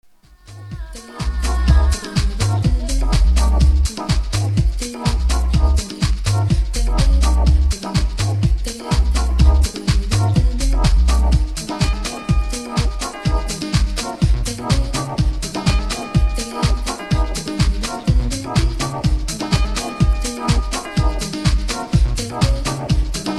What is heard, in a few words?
Mixing Show House